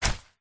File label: umbrella folded set down; foley